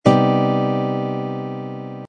c minor major ninth chord voicing, guitar

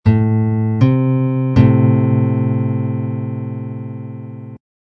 minor third

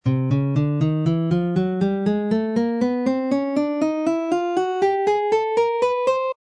c chromatic scale alternate pattern, guitar

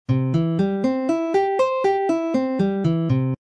c major arpeggio pattern, guitar

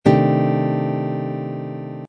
c minor six nine chord voicing, guitar

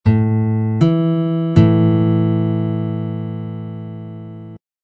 perfect fifth

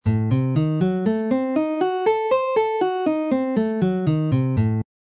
a diminished seventh arpeggio pattern, guitar

